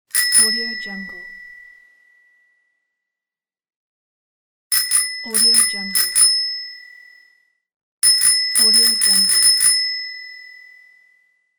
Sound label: bicycle bell ringings; medartimus